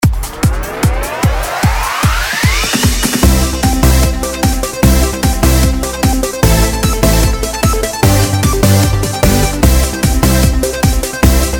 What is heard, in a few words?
free spin tune; lucky emoji